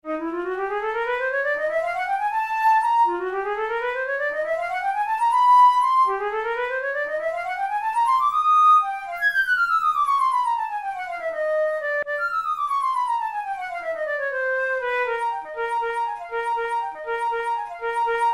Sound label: melodic exercises, flute tunes